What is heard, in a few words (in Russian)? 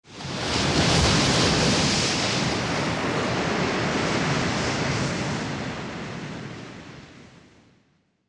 bolshaja volna